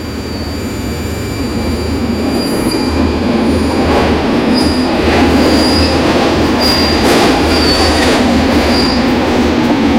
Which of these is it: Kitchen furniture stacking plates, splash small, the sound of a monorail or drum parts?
the sound of a monorail